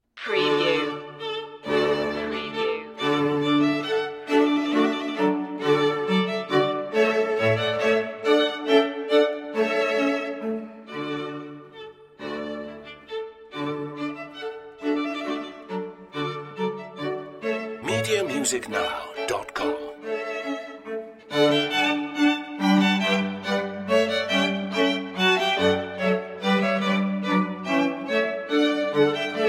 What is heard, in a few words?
menuets string quartet; handel; music for the royal fireworks; hwv 351